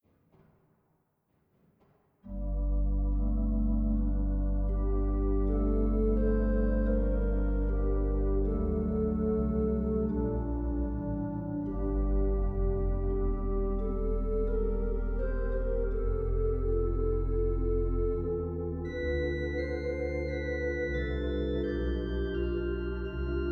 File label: Improvisation for Baroque Church, organ; organteq2